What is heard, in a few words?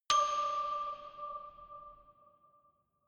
notification glockenspiel creepy single low